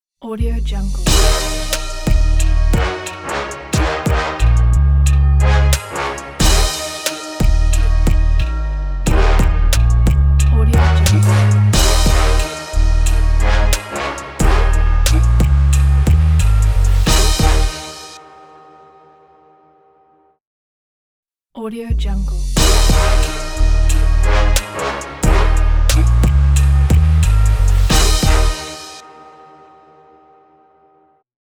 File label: dark trap ident